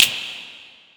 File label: Snap; osu